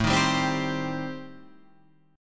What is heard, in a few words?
A-Minor 7th Flat 5th, Guitar Half Step Down, chord